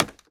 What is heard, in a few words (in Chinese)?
竹踩踏声